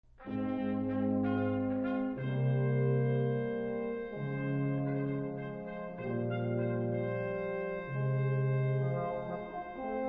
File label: post horn